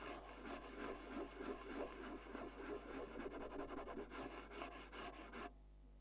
kaparás, guitar

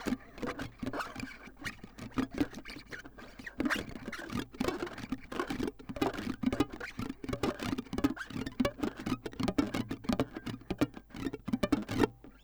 sika, guitar

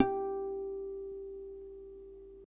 üveghang hosszú, guitar

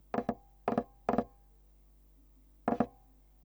kopogás gyors, guitar

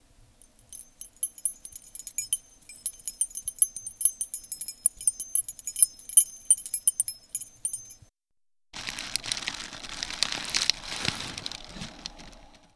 zajosság

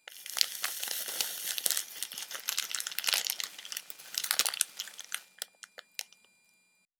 zajosság; 5 hang zajossag

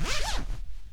zipzár; 5hangú

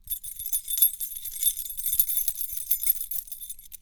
kulcscsörgés; zajosság szerint

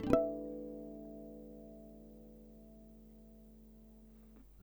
üveg mind, guitar

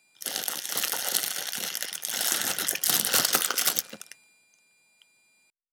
zajosság; 5 hang zajossag

